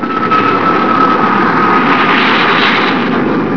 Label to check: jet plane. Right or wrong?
Right